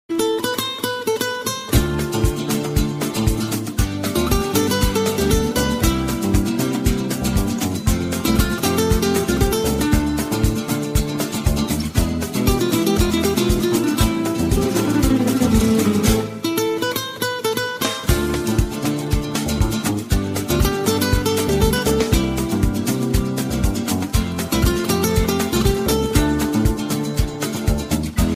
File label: gitarre ringtone; armic